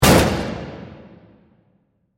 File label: Hit Impact; Production Element, Imaging Element, Accent, Transition; BlastWave